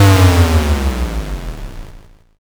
explosion l

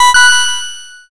correct

